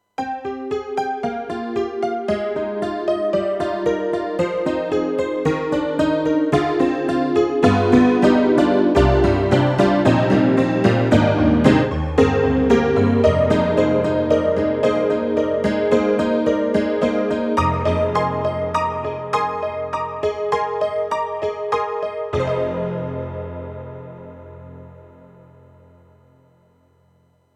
YAMAHA MU500 Hnky Tonk

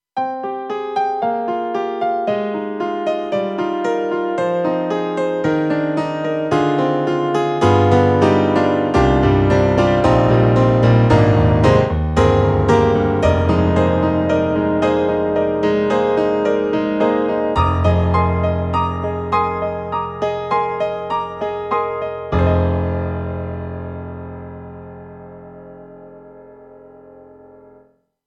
Mono Piano+Damper; KORG M3 XPanded Program

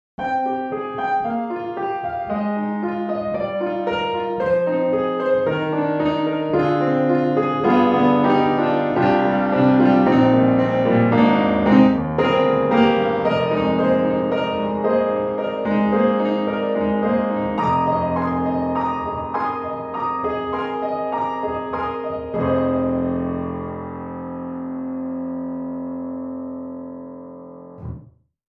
Grand Rhapsody Piano, Songs from a Room; Waves

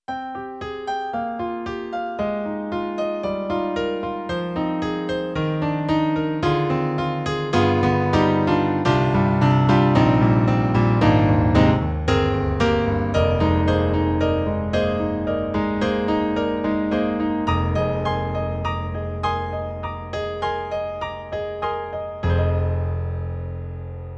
Concert Piano; Roland; SonicCell

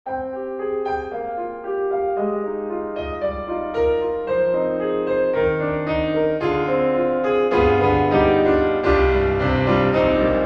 Piano Ambient; Cinesamples; CinePiano

